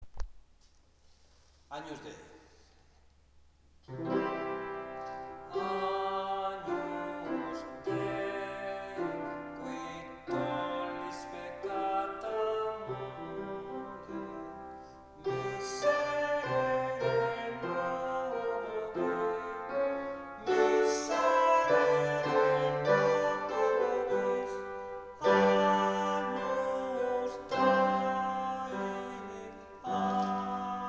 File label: agnus dei sop; gounod